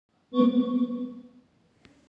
nintendo switch danger notification

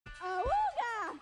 awoooooga

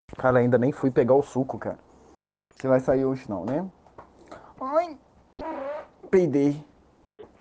ain

